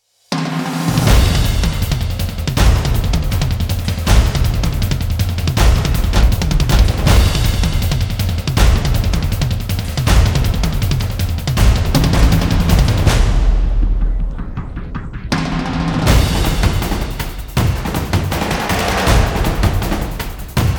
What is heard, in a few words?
percussion mix